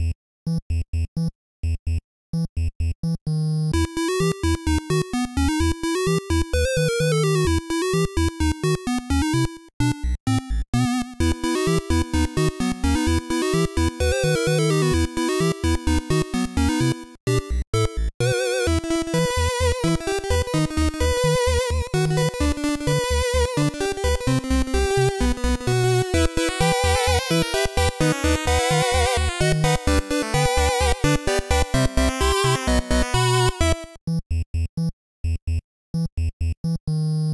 music supply loop; Skellboy

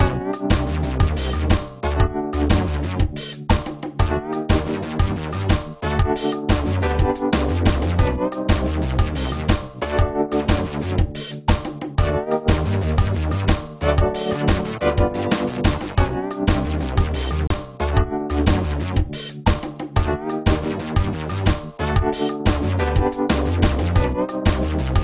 Horror; Taiko no Tatsujin Chibi Dragon to Fushigi na Orb 2012; 3DS

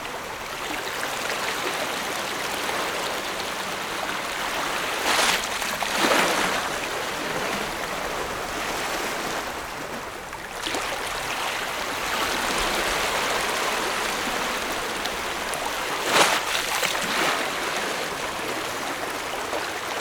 Ambience Exterior Coastline; American Fugitive

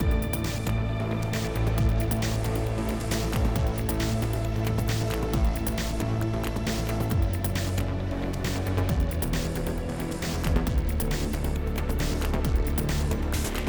Combat, 135BPM; TheView Act3

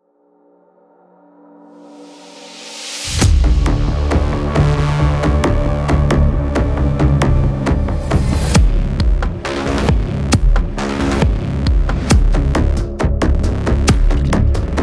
Music Main Ambient; Dlc2 Overgrown Earth Music Main Ambient 0

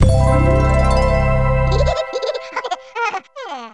Win Level; Sokoban Next

